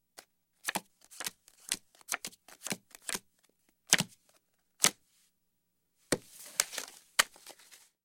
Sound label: Cards Dealt Cards Dea, casino games cards